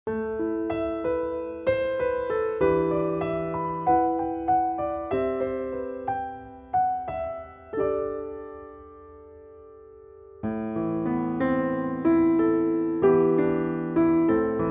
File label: voice piano Am